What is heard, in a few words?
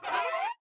Cry; Taifu